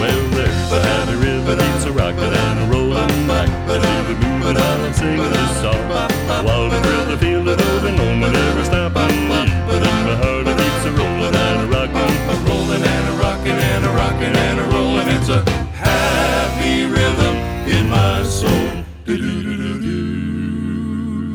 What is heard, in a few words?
Happy Rhythm samples